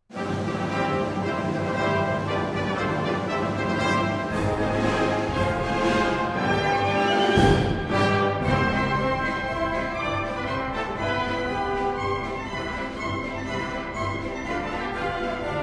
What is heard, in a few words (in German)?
marsch